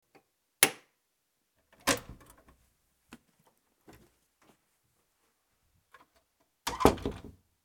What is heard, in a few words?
door; 電気のスイッチを入れ、ドアを開閉する流れ